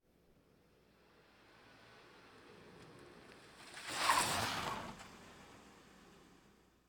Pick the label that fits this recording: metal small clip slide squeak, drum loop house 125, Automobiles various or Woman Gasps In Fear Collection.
Automobiles various